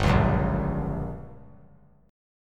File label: G Minor Major 7th Flat 5th, G, down, 3C 3F 3A 3D; strummed; JGuitar